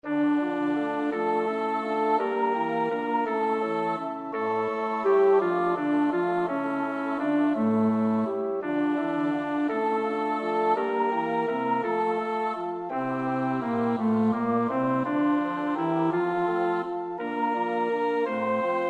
bas; Kyrie; Haydn (Michael); Missa tempore Quadragesimae

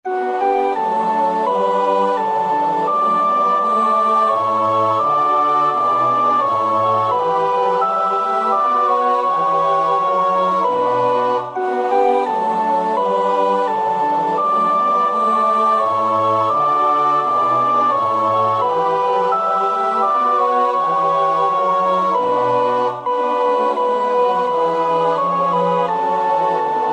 Sopraan 1, koorpartij-oefening; BWV 244 Matthäus Passion; CHORALE-Was mein Gott will, das g'scheh' allzeit (koor 1 en 2)

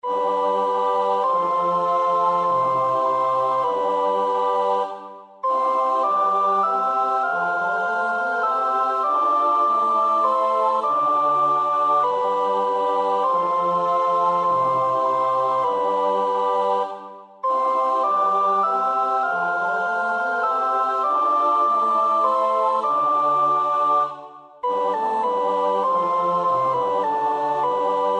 sopraan; Lasso (Orlando di)

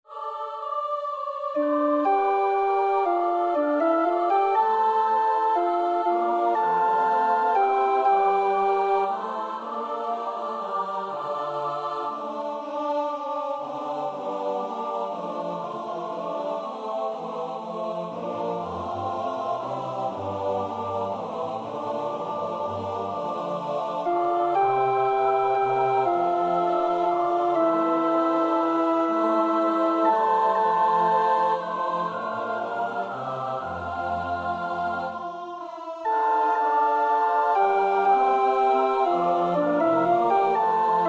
alt 1; Röntgen (Julius); 6 oude Nederlandsche liederen

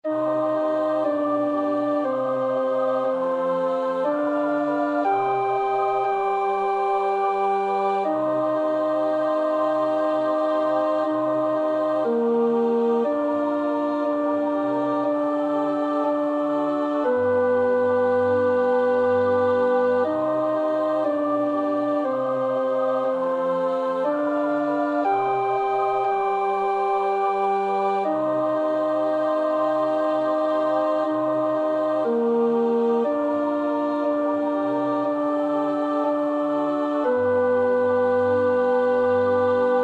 tenor, koorpartij oefening; Telemann (Philip); Machet die Tore weit

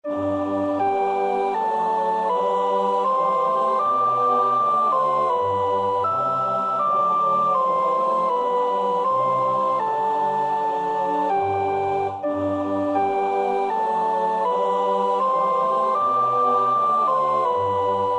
sopraan, koorpartij oefening; Bach (Johann Sebastiaan); BWV 069; from BWV. 69a

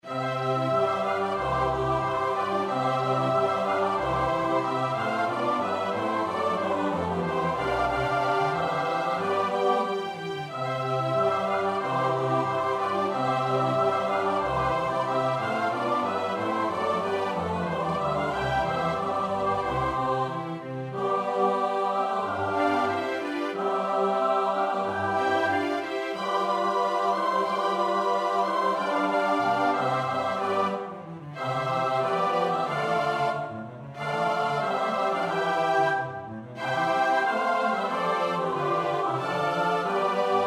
S-A-T-B, koorpartij oefening; Mozart (Wolfgang Amadeus); Mis in C (Krönungsmesse) KV 317; vanaf maat 70

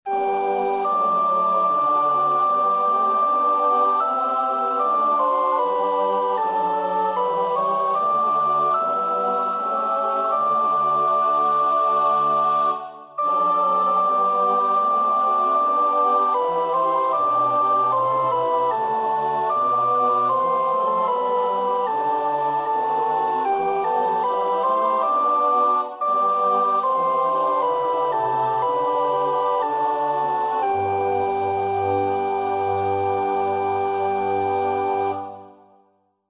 sopraan; Bach (Johann Sebastiaan); Heut’ schleußt er wieder auf die Tür

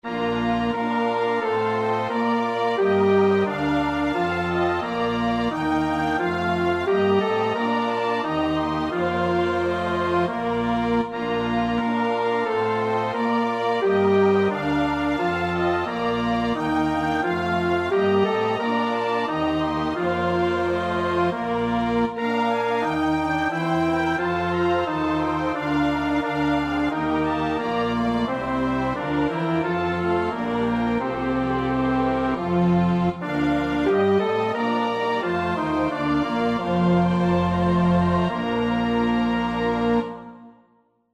bas; Bach (Johann Sebastiaan); BWV 012; 7 Was Gott tut, das ist wohlgetan

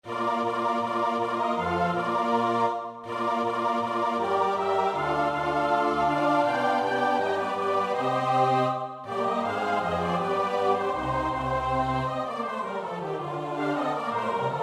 S-A-T-B, koorpartij oefening; Purcell (Henry); Dido and Aeneas

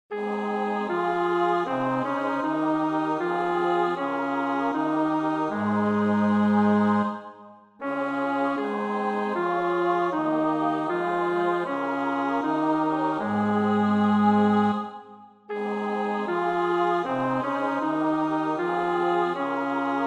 bas, koorpartij oefening; Graun (Carl Heinrich); Der Tod Jesu

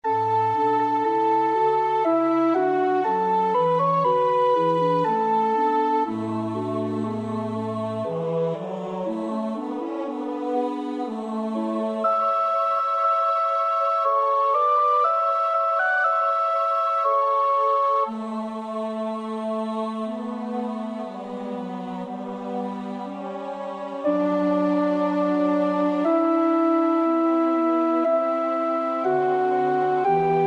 orkest, sopraan; Saint Saëns (Camille); Oaratoire de Noël

